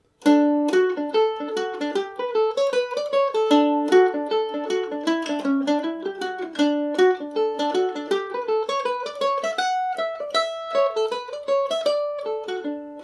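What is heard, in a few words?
hovering dewdrop D major, irish mandolin; G&O #34 mandolin; Listen to me playing the tune on my G&O #34 mandolin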